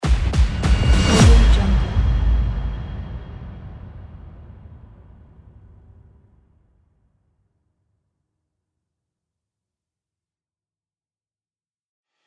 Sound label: trailer tech hits; lunamycreations